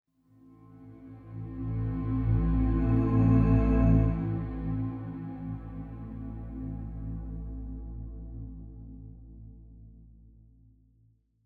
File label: Explore Day Pad chords; horizon zero dawn